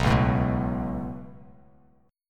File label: B 7th Suspended 2nd; B7sus2; strummed; JGuitar